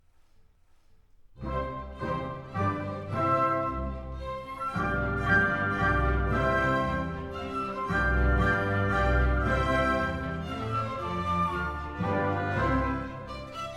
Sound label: Menuetto C